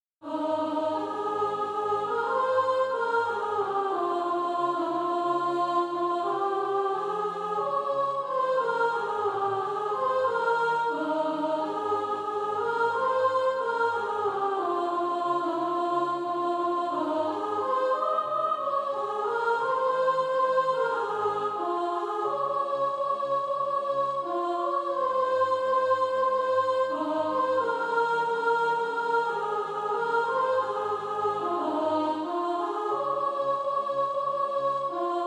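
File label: soprano, Practise Music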